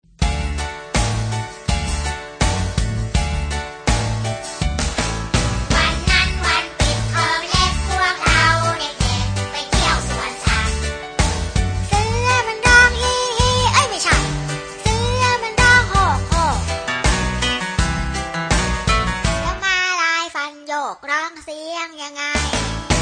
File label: Voices